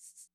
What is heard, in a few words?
swing shaker; Hiphop